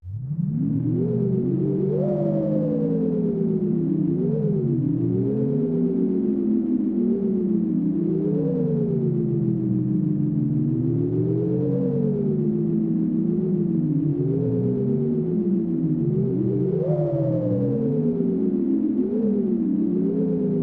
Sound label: Wind; Sound Novel Tsukuru; SNES